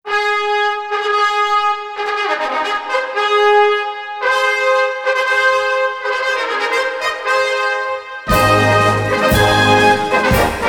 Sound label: fanfare